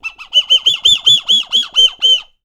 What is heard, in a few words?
bird call; Voice Crystal - Steve Reid Definitive Percussion